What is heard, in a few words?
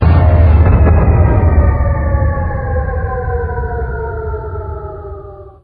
large generator off